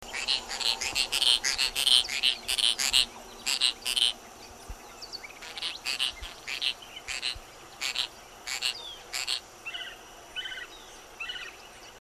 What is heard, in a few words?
masked tityra, birdsongs, belize